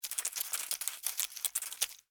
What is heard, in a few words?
Insect Walk Dirt, Insect, Walk, Dirt, Short, Movement, Bug; Animals:Insects; Blastwave